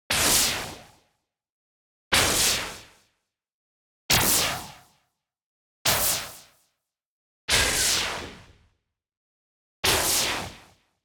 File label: IMPACT WHITE NOISE Filtered Noise Simple Zappy Fast; boom library; Anime Essentials